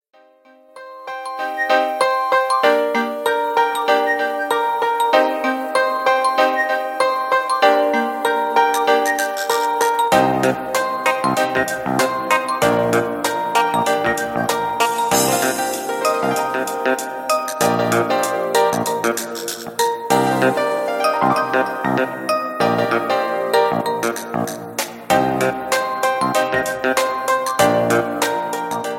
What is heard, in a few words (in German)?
Handy, ringtones